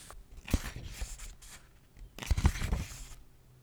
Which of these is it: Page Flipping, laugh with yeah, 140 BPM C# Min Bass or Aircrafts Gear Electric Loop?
Page Flipping